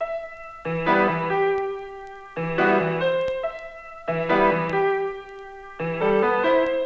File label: piano; chaplin